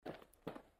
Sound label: walk